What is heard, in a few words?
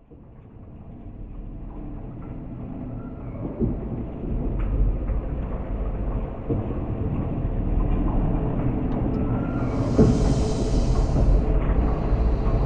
suspense; DayZ-Epoch; dayz sfx